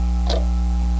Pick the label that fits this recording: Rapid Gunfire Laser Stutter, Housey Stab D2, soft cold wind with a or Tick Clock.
Tick Clock